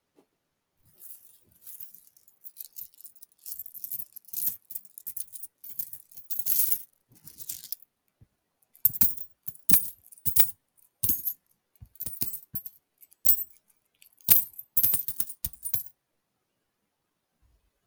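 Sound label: coin sound, sounds of ringing coins and kopecks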